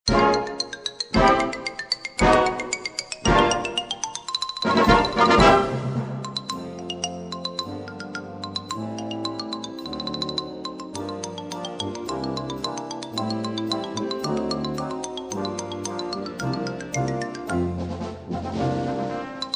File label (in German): blasmusik symphonisch